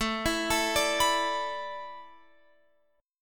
A Suspended 2nd Suspended 4th, Guitar, Open F; Asus2sus4; arpeggiated

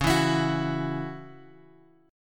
C sharp Minor Double Flat 5th C sharp, chord; C#mbb5 Chord {X 4 2 X 5 2} strummed

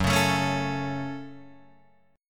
F sharp Minor F sharp, Guitar Open D

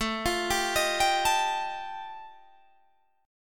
G 7th Suspended 2nd Sharp 5th A chord

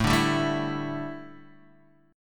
A 7th Suspended 4th, A, down, Guitar, Standard; A7sus4; strummed; JGuitar

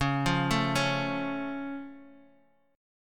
C sharp Augmented down Guitar DADGAD, chord

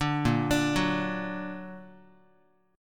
Bb Augmented Bb, Guitar; arpeggiated; JGuitar

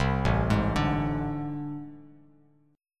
A Minor Major 11th, Bass; arpeggiated; JGuitar

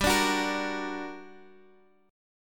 G Minor Major 7th, down

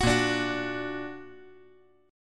Bb Augmented Bb; strummed; JGuitar

